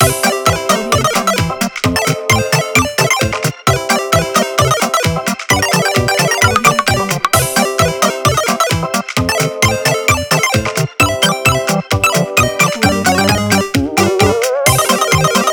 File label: game play